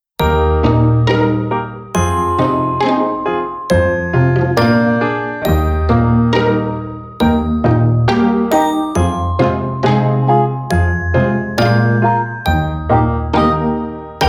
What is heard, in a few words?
Cheerful Commercial long Bell